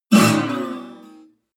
bottleneck strings slide down noise

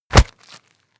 punch low smack hit

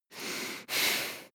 breath female heavy nose

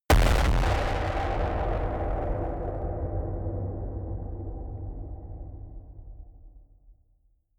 distorted explosions stereo

